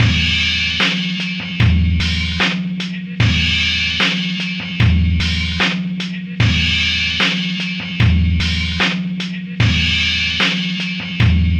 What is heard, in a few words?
75 live breaks